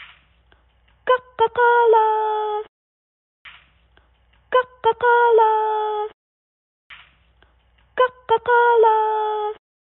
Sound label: wakeup call